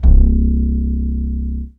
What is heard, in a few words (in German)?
Deep, baesse